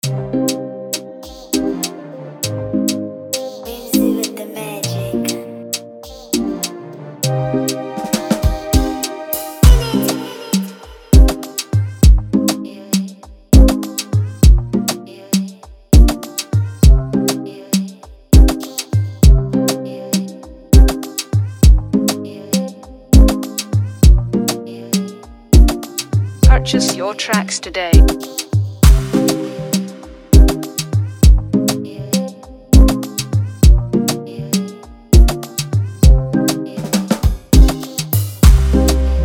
Type Beat, Afrobeat instrumental

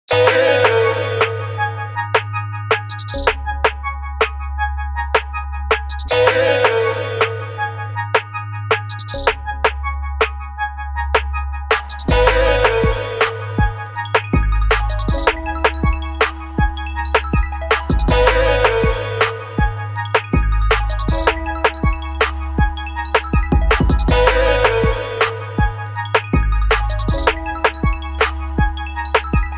Shamstep Beat

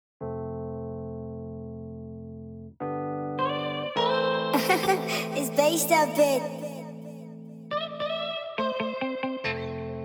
Afrobeat instrumental

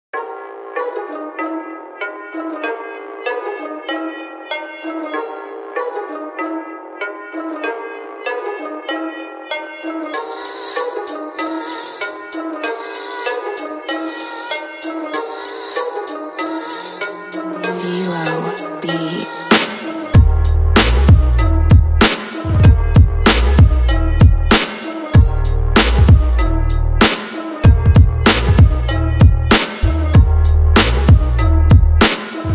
Mainstream Hardcore Beat

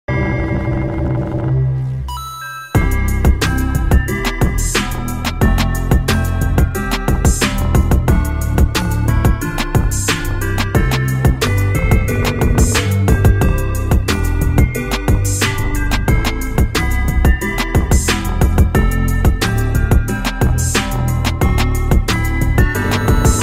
Sovietwave Beat

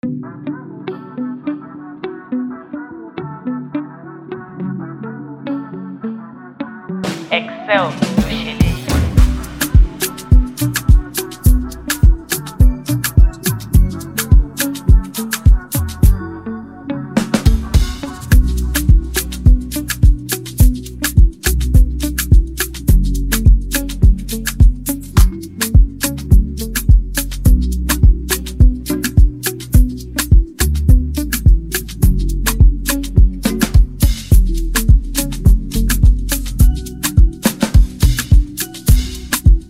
Afrobeat instrumental

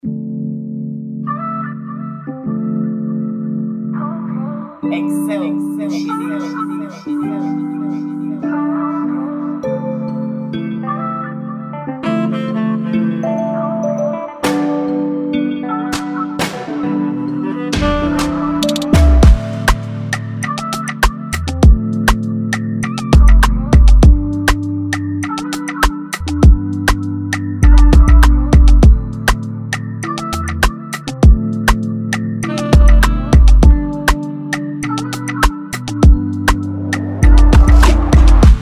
Afro Gospel Type Beat

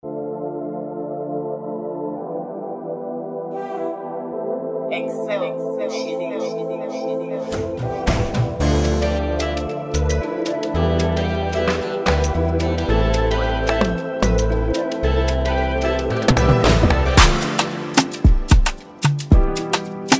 Afrobeat instrumental

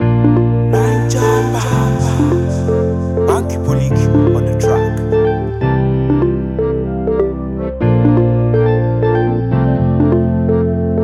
progression type beat, Afro Groove instrumental

